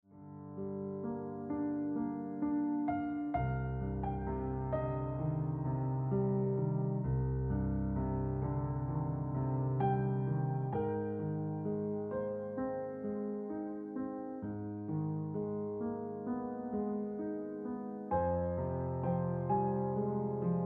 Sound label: Gentle Ringtone Piano Music